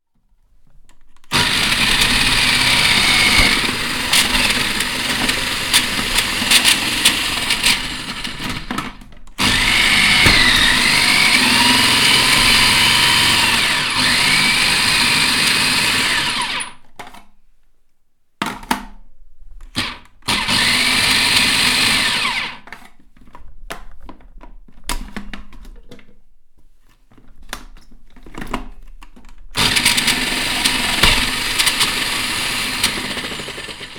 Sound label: Food Mixer